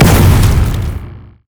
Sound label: wildfire; LoL; brand zombie